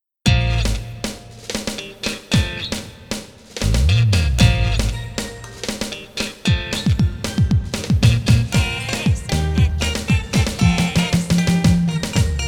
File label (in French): instrumental